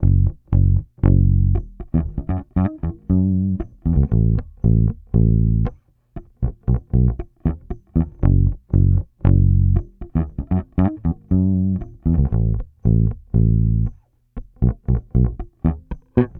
Bass loop 117 Gm, Electric Bass Loops Direct; Slate Digital BASSHOLE Sample Pack

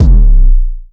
Kick Jelly, 808 Kicks; Slate; Vintage Drum Machines Volume 2 808 Edition

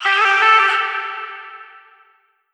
Omnivox oneshot Am, Vocal; One Shots; Slate Digital Omnivox Vocal Sample Pack

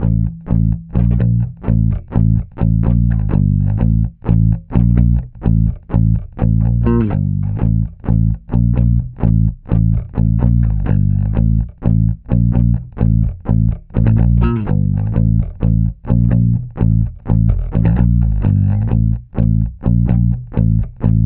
Bass loop 127 Am, Electric Bass Loops Direct; Slate Digital BASSHOLE Sample Pack

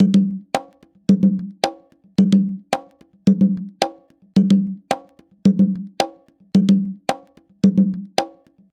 latin conga loop, 110; perc construction loops; SEISMIC Drum Sample Pack; Slate

